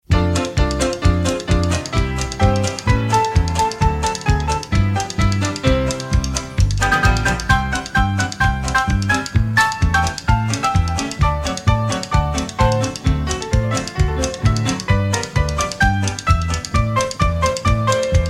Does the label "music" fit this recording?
Yes